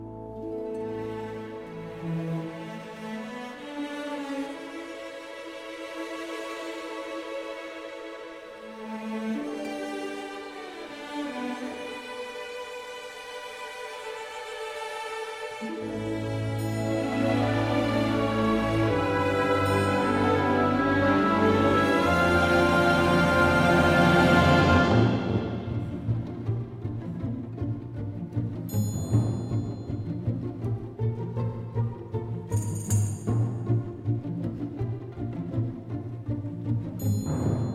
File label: Orchestral